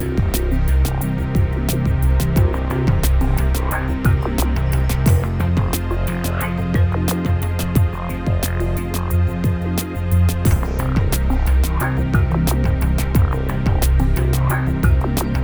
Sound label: Cyber City Game Menu; sci-fi